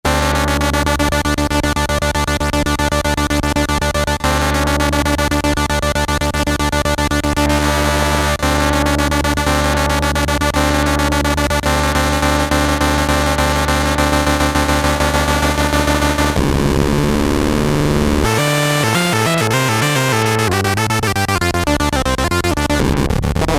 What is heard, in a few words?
Progressiv Slide